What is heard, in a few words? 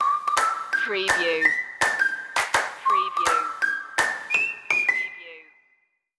learning for life stinger